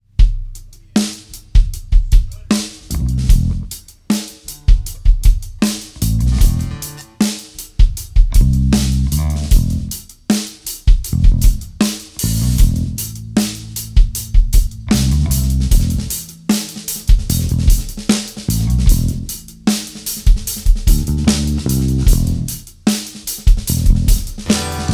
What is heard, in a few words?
gtr